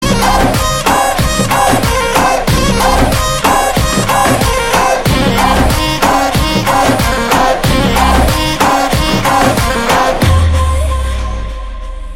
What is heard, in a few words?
Instrumental Tune